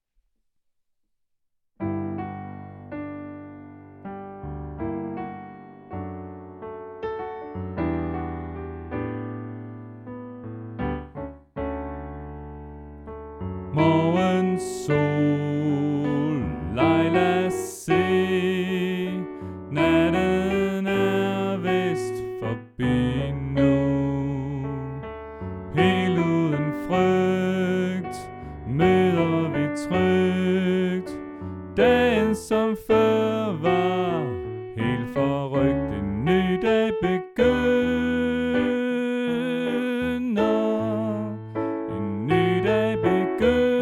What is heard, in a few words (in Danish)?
Sopran